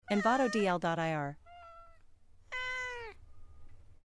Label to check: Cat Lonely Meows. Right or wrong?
Right